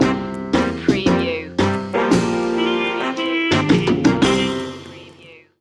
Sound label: retro theme stinger